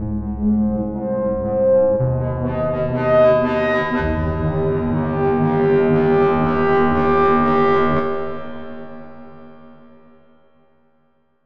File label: bass wet; sonivox twist